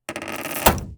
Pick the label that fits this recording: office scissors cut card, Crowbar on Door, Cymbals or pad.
Crowbar on Door